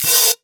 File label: Ride, Cymbals; Vengeance Samples